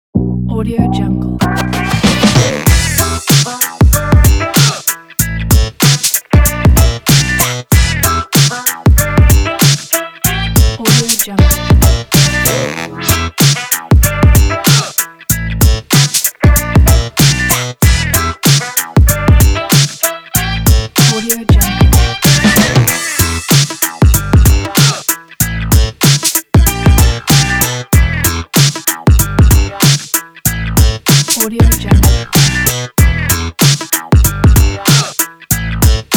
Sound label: funky hiphop; mark-music